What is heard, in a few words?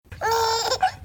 laugh